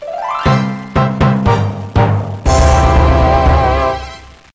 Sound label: Quest Completed